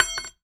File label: Aluminium Tube Drop